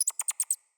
Night Creature Close; Night One Offs; Ambience

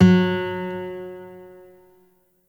Shawzin Oct One F Sharp; Warframe; Lotus Shawzin

